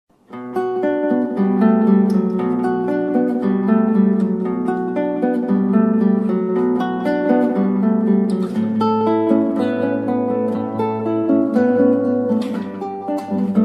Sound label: karaoke